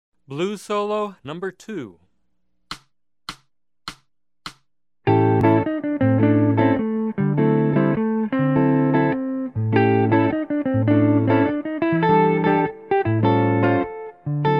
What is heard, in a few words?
Blues Solo, guitarra; jazz